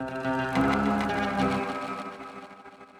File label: ethno, 160, C